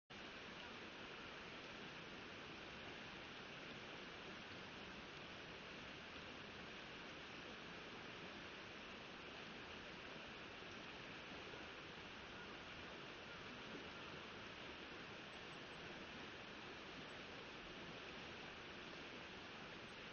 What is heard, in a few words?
riverside